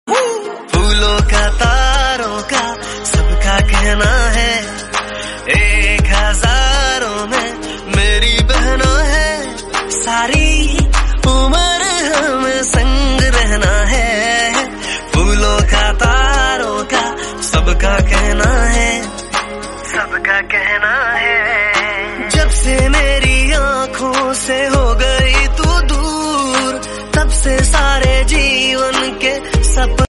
sister hindi song

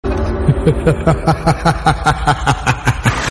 laughing sound; ravan

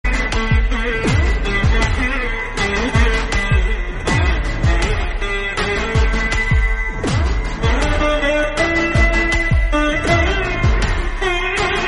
violin, veena

